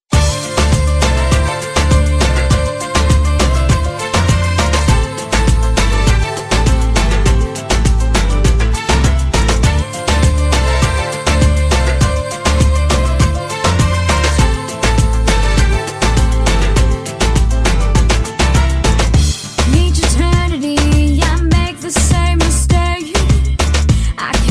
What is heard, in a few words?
snake charmer